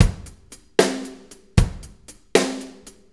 6-8 Loop, Full Kit Loops; Drum Tools

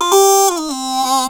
Talk Box "oooh"

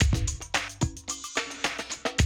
drum loop, 100 BPM

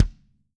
KICK, Living Drums; Sampleheads; Peter Erskine; PB KICK+TOMS

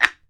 BAMBOO, World Assortment

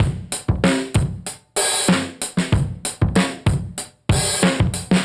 Beat Xtra Dust 95, dusty funk; musicradar; Beats; 95bpm; Alt Sound

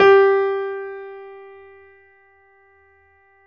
PIANO G4, GRAND PNO

